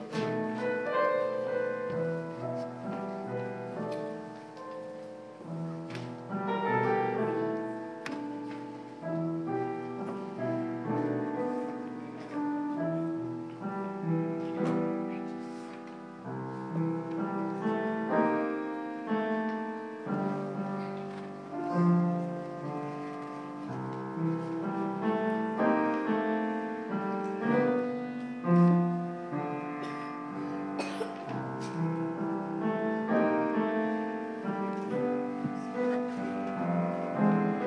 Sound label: piano